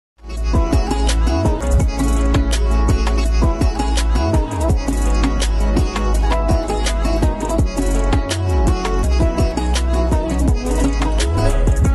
drama ost ringtone background music ringtone pak drama ringtone; kaffara